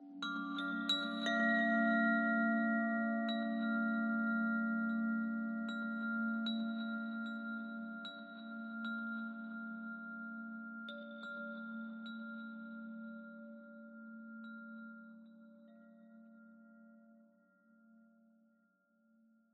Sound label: ambient abstract chimes high